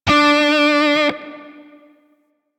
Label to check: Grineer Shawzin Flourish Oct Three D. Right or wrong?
Right